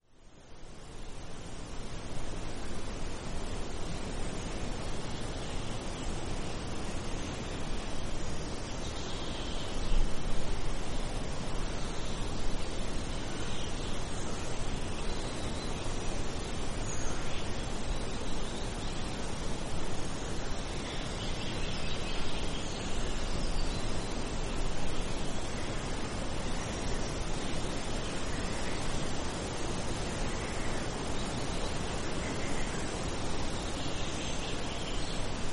foret

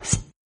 ballon gonfle

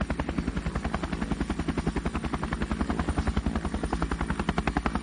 helico

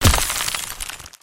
gel; transformice

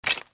squelette os

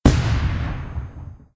boum; fortoresse

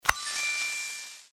bonus bombe

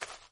herbe, pas

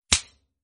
piege loup

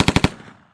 rafale; fortoresse

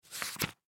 journal ouverture; deadmaze

